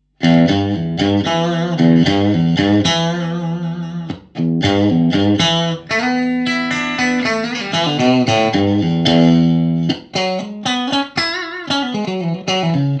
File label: 56 clean bridge, Strat; schwarzbach; VP-Strat-56